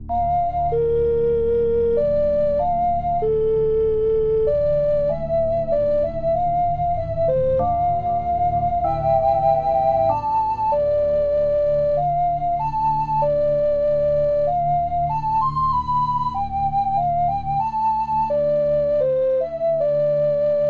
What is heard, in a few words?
ocarina vibrato